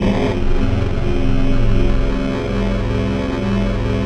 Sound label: synth clav